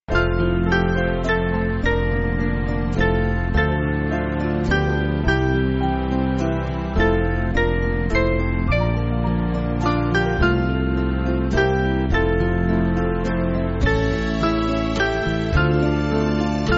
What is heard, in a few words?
Band, church music; Shepherd Divine; Winterbourne